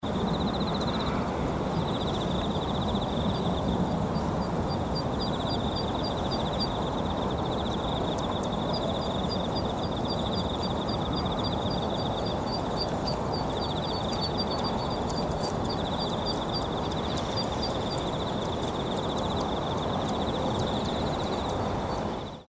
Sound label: rice field